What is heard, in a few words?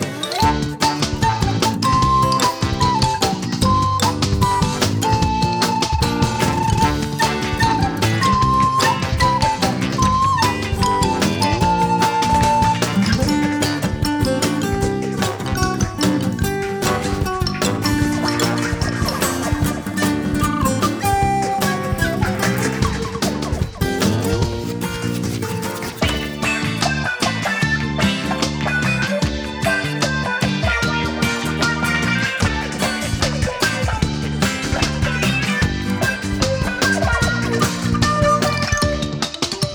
Menu Music; Cut the Rope Arcade